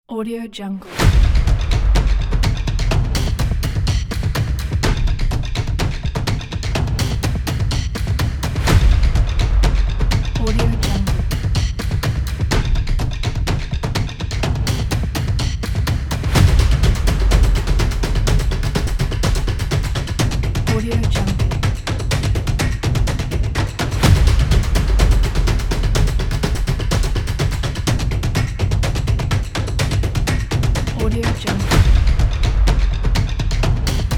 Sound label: epicness drums